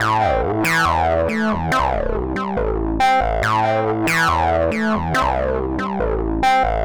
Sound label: TECHNO SYNTH 140; Keys